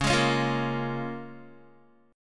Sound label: Db 6th, Guitar; strummed; 9,11,11,10,10,x